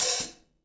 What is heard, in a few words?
open closed hat, gretsch; Dirt-Samples